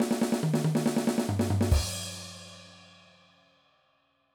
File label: Punk Fill, drum